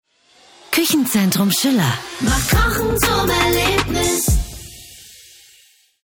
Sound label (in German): radiospotjingle gesang voice; Muttersprache Deutsch; Sprecher in Berlin, Dresden